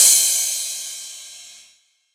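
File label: crash cymbal, Individual Hits; neuro; Stanza; Drums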